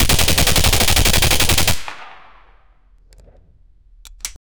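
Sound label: firing misfires three rounds, assault rifle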